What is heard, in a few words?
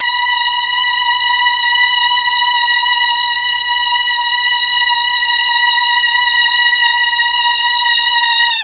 B3 16 violin, mellotron; TRON 16VLNS